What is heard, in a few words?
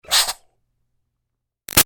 Steam Release Iron Chuff Hard; Household; Miscellaneous Household; Big Room Sound